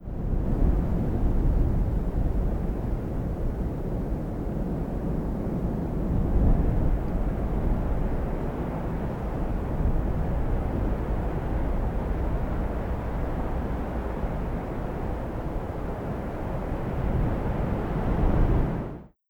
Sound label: Blackrock Wind